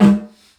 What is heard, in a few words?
Didgeridoo Kit Samples